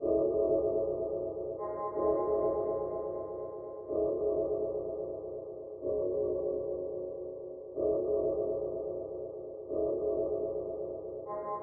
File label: far away bells; Atmospherics